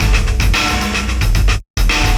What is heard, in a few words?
live beat industrial; Sonic Foundry (Sony Creative Software); Ugly Remnants VOL-2